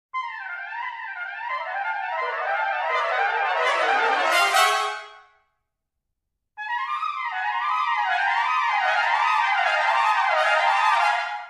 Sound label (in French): ouverture pour cinq trompettes